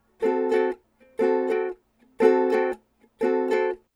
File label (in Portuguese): Ukulele Loops